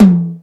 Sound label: ROCK TOM HI; Greytsounds VOL-1